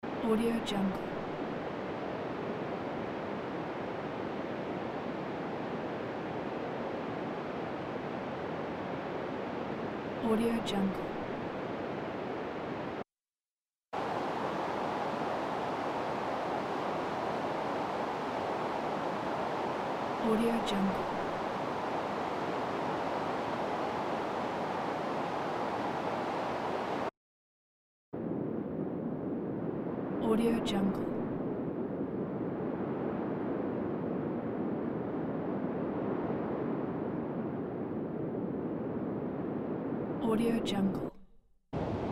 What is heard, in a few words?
wind day desert